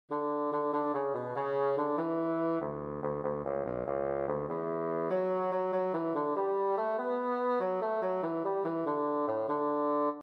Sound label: Bassoon